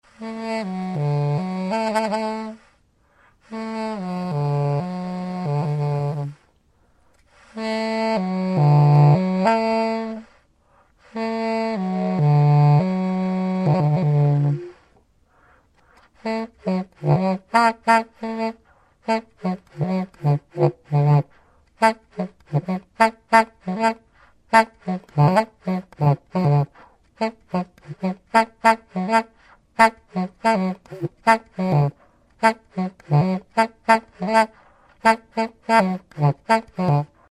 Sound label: kelp horn